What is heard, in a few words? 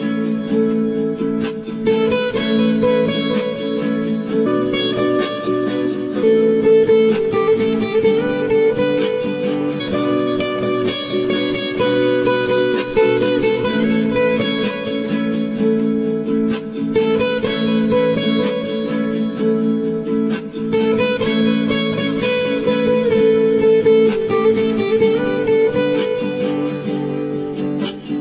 Oppo A5s Original Tune Top Ringtone